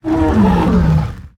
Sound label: Druid Of The Flame, Death